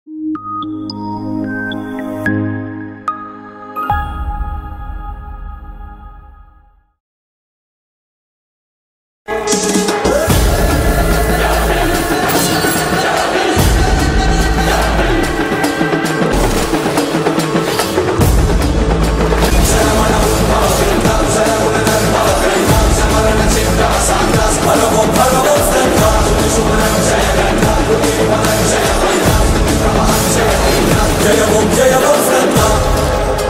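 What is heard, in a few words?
title glimpse background music; skanda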